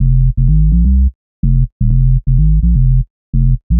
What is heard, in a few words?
126 F BASS, Funky Underground bass loops; Loopmasters; Funky Underground Vol 2